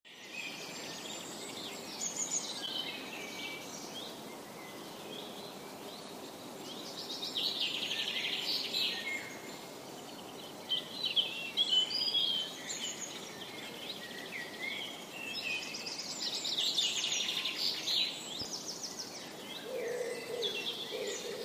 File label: birdsong in fishpond wood